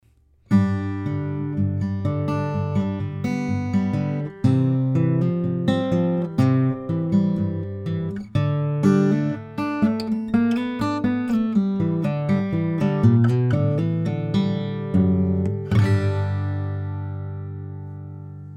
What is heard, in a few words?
Waterloo Jumbo King Standard